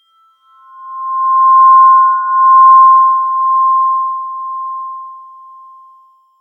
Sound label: Ichigenkin (with slide), Ich * 16; Auto-Convolved Sound